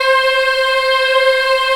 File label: C4 child eh, Choir; USB Soundscan vol.28